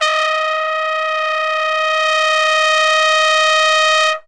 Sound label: TRUMPET FX, Phantom Horns; Zero-G